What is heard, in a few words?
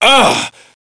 pain, player; SINDEMO; blade